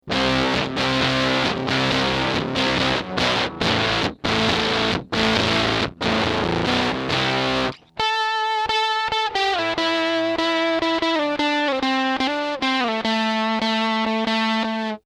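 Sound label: Octavia, min. Intensity, guitar; Tycobrahe; Recorded by Gary Burchett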